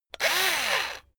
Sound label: electric drill screw out short; soundpool